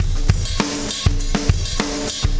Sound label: Wormhole Beat, Processed Drumkits